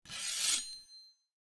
Draw Sword; soundscrate

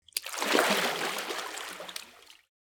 heavy splash; soundscrate

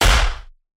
High Distorted Gun Shot; SoundsCrate

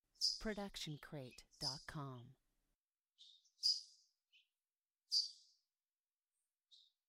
Multiple Birds Chirping; SoundsCrate

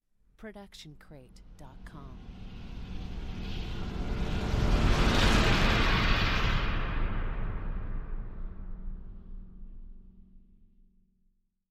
Fast Horror Riser; SoundsCrate

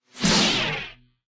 lightsword turning on; soundscrate

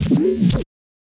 stylized glitch sound; soundscrate

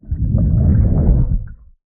underwater dive; soundscrate

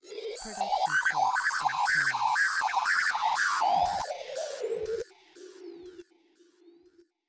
control panel gone haywire; soundscrate

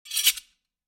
sword click; soundscrate

